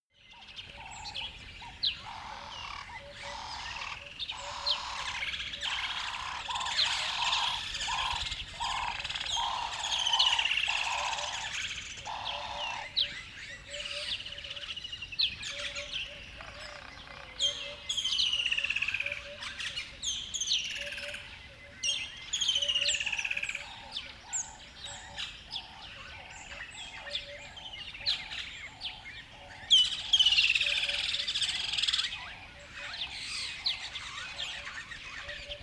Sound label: river bed dawn birds upset